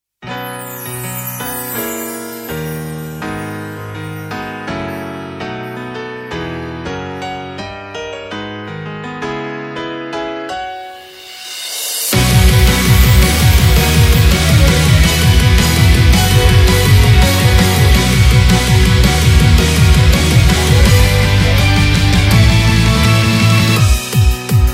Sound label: off vocal